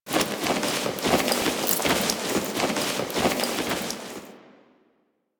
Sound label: Shake Movement; Warframe; Quests; The New War; Drifter; Foley